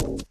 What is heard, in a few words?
glass, footsteps, run